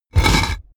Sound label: mechanical switch stone medium push